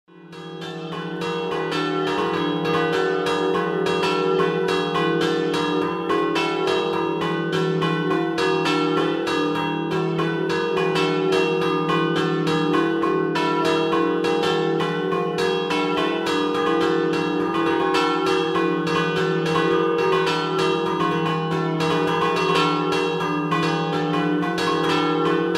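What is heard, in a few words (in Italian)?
Villacaccia scampanottata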